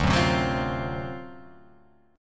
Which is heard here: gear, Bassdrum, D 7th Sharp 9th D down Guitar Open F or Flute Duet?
D 7th Sharp 9th D down Guitar Open F